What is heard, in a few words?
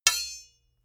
sword hit sweetener